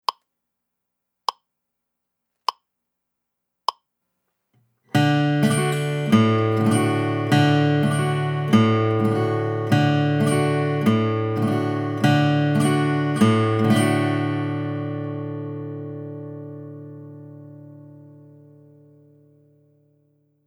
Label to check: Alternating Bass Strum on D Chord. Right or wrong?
Right